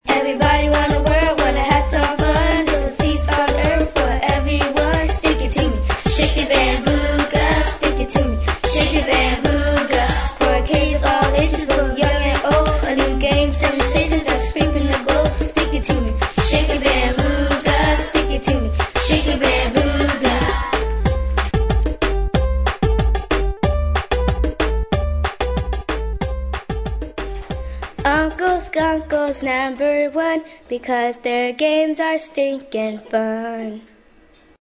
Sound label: Jingle